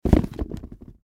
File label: Boots Set Down, Boots Set Down Shoes Drop; foley; Offices; Blastwave